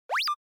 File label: Anime Motion Fast